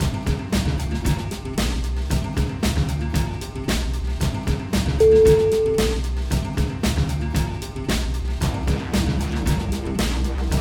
action movie

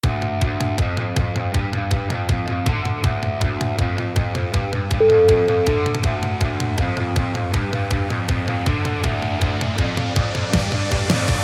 hard and heavy

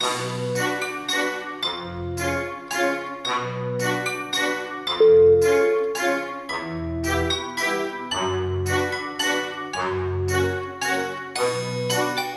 horror circus

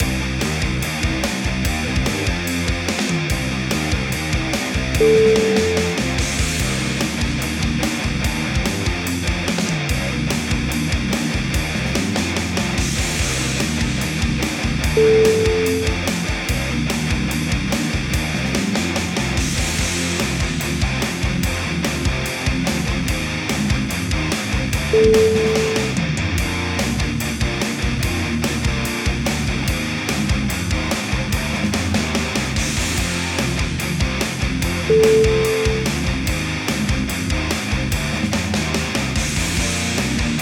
groove metal power

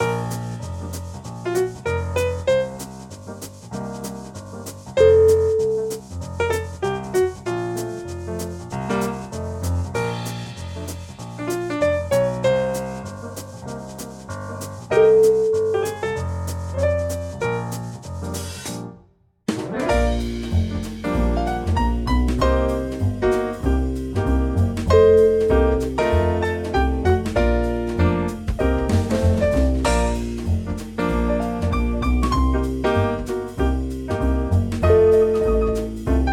in a jazzy mood

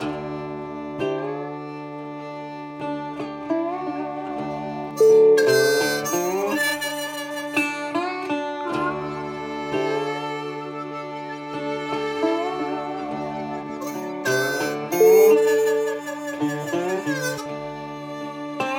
swampy river blues

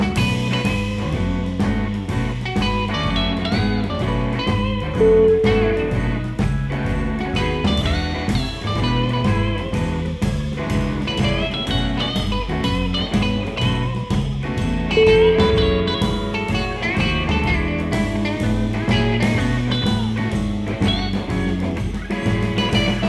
foot on the beat

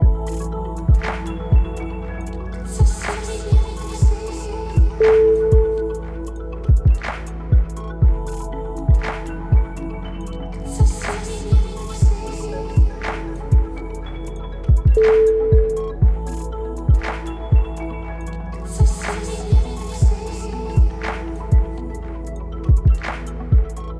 ambient dream

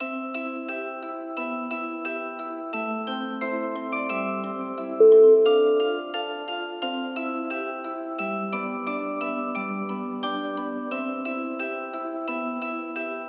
jingle bells lullaby

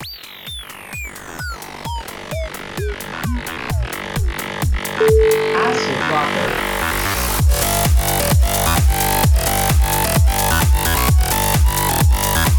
acid rocker

